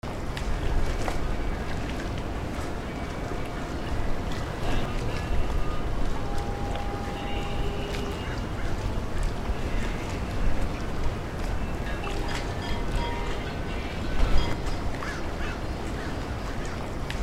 harbor docks; morro bay

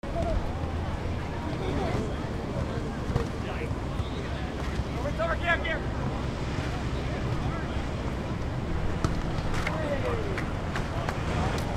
beach volleyball players; huntington beach

